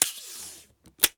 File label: Masking Tape; Office Supplies; 1001 Sound Effects